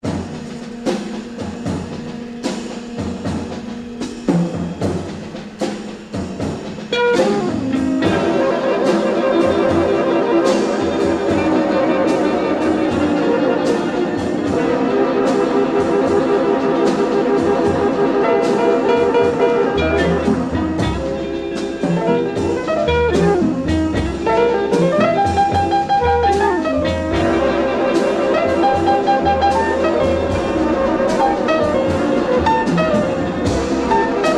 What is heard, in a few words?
flute song